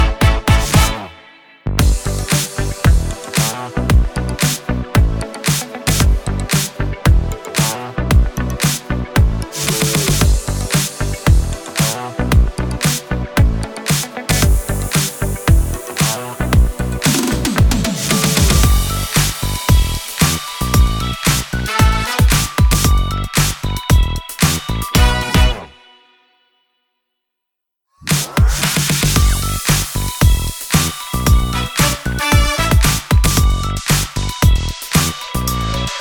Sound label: Accompaniment; Descendants